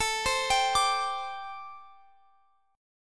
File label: A Minor 11th A Guitar Open F; x,x,21,19,22,21; arpeggiated; Am11